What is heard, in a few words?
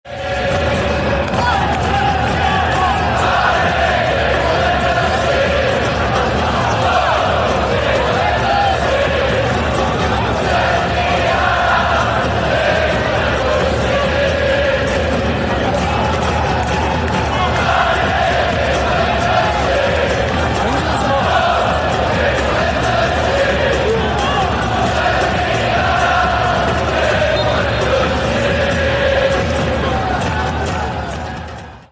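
goals daegu, football chant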